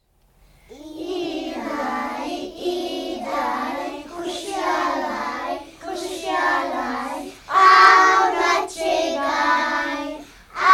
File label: eid song